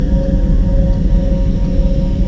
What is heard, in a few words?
Amb Machine Interior; Defiance